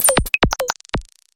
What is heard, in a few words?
ring